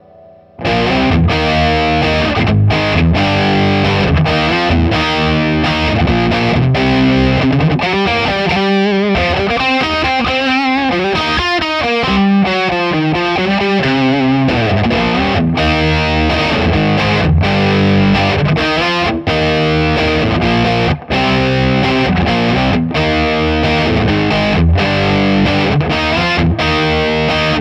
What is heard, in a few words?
Lead